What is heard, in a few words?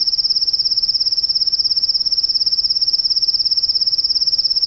Birds Loop; kult